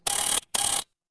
office alarm clock

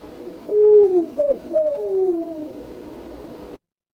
animal owl

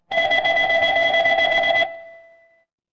door doorbell

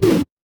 retro game game over

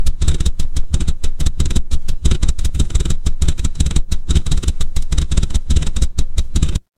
mechanical engine

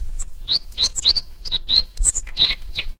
animal bird

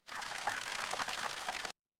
water stream

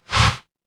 ui swipe

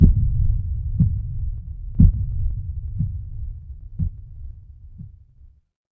horror heartbeat

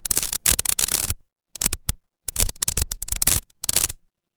fire electric arc

